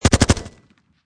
Machine Gun Heckler And Koch G36 Short Burst Close Perspective